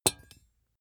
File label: small metal drop clang hard; industrie wirtschaft; metall